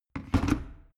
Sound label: plastic water boiler place